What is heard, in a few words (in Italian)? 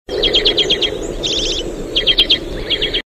Organetto Carduelis flammea; Versi Animali; Il Forum della Fotografia Naturalistica